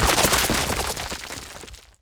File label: debris stone stereo